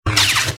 lightsabers clash; star wars; adv lightsabers

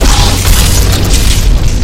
lightsabers activate cross; star wars; adv lightsabers

